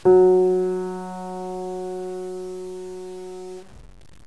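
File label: piano F sharp 2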